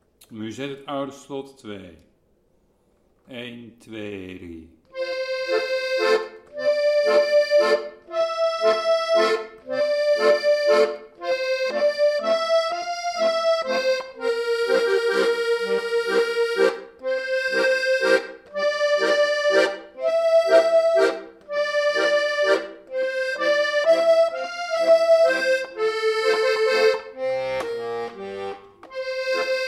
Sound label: musette